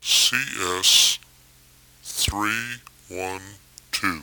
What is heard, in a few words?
Change Speed